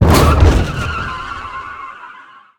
hit car; Blind Drive

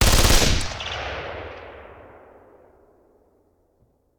Machine Gun; Sound Ideas; Guns

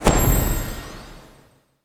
Affinity Good; AudioWizards

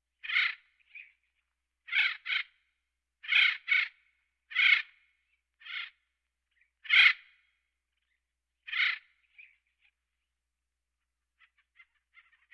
Birds

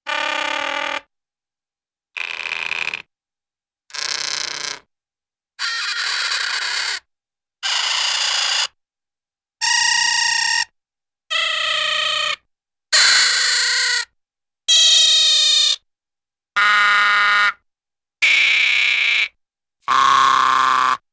Raspberries - Various 'bbblp' Type Razzes; Cartoon; Hollywood Edge; Cartoon Trax

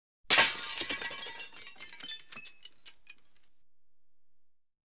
Audience Applause

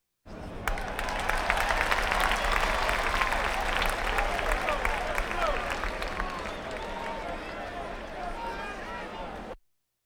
Crowds Race cars Baseball

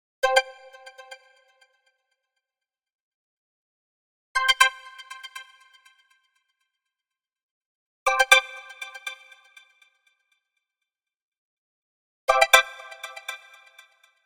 Arcade Jingle Positive; boom library; The Interface

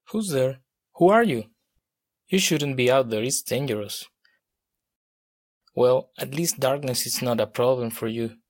guitarist; DeadFright

